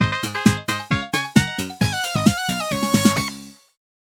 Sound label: Battle Won